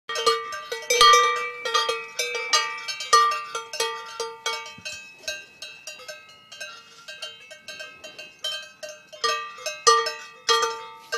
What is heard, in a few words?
herd of cows